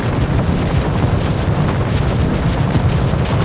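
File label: droideka Roll loop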